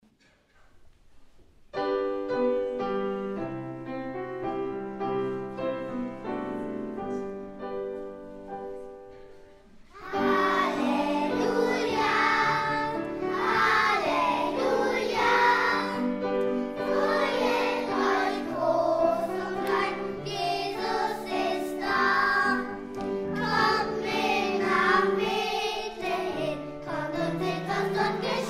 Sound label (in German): Kinderlied; Halleluja